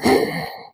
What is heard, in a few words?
Exhausted; Amanita